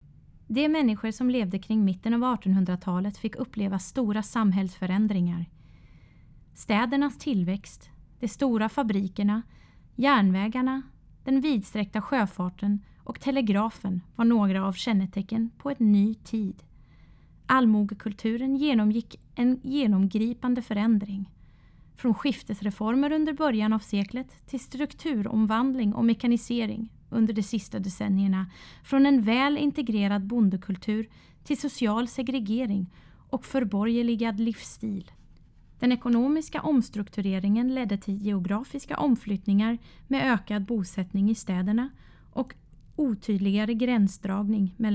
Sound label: Svenska Sjung!